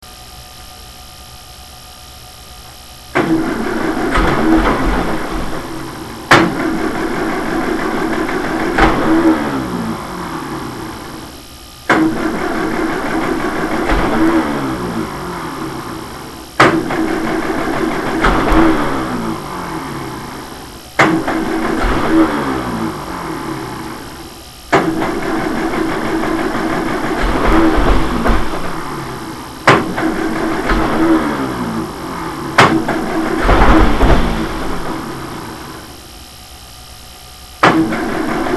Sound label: start rough, Healey engine sounds